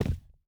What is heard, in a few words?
footstep boots concrete jump land; Rust